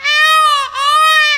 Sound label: BIRDY; Optical Media International; Sonic Images Library; SI FX Vol 2